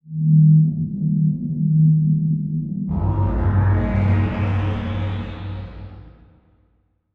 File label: Title Transition Power Source; Production Element